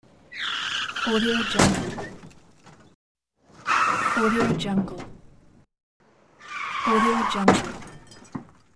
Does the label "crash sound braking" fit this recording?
Yes